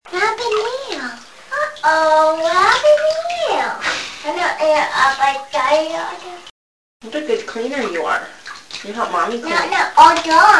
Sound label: bath